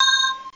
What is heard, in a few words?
ringer